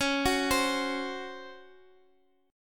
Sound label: C sharp Major 7th Suspended 4th C sharp; arpeggiated; x,x,x,6,7,8